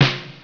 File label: DRUM